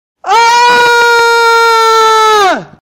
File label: unholy screaming